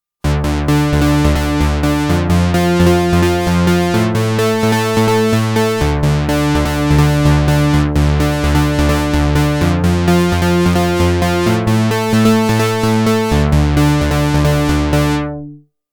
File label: 80s Stab